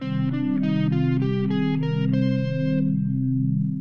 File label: C Aeolian